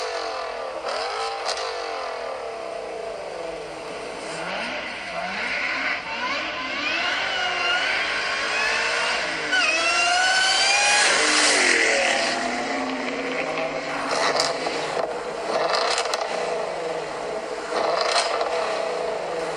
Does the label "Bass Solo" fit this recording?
No